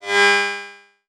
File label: Beep Low Pitch; Multimedia Internet CD-Rom Flash